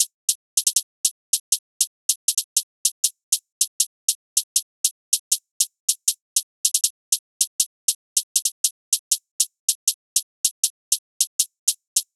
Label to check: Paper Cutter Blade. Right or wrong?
Wrong